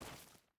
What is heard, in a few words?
footstep burlap stones walk; Rust